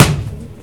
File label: Ball Rugby